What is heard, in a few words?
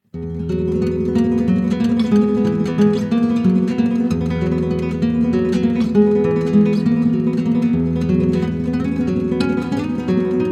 Tremolo Study